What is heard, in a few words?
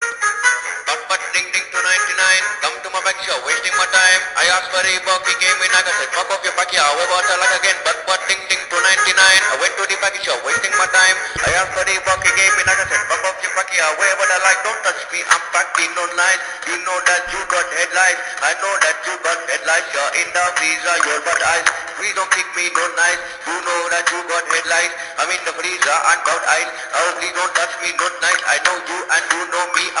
Budbud Ding Ding Indian Ringtone